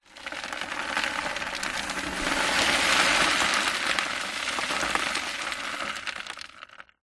stones small debris in cardboard box tip to side movement; foley; zapsplat